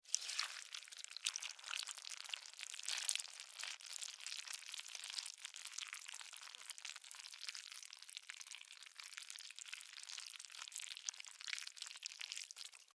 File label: Larva Grub Move, Larva, Grub, Movement, Slimy, Long, Insect, Bug; Larvae; Animals:Insects; Blastwave